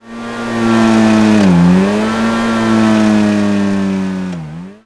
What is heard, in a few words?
throttle off, vehicles; gtav